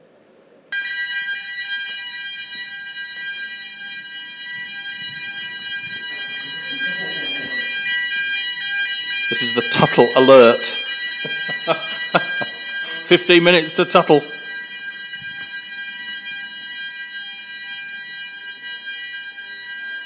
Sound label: alert (loud!)